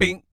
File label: BASS FX, Vocal Planet, Jazz FX; Spectrasonics